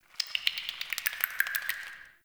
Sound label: Cave Shrine Critter, Ambience; Midrath Island